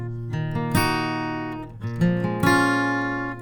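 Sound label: 451 front, Accoustic Guitar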